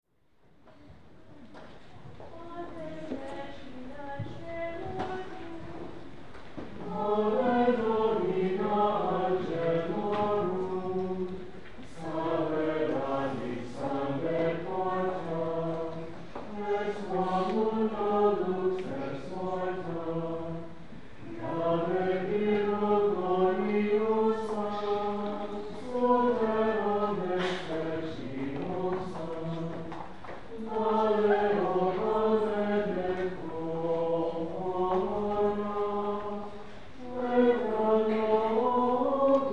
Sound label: Ave Regina Caelorum chant